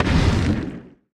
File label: asteroid collision interstellar small; Factorio